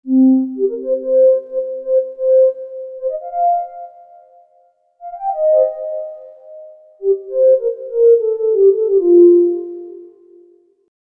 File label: Memory Moog Flute ensemble